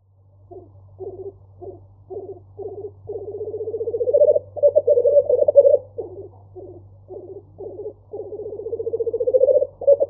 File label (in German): Birkhuhn Balzlaut